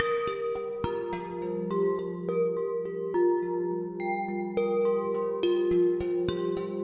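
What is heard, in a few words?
Gentle Mallets, A# Major 105 bpm; MIDI Clips; Tonal; Motifs; Ableton; Factory Packs; Mood Reel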